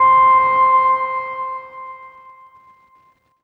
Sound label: Prologue KEYS Crystalline High - C; One Shots; Cymatics; Fantasy Synth Sample Pack